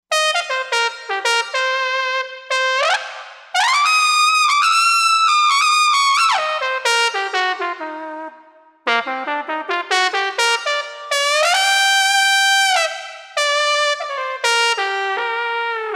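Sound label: Brass Trumpet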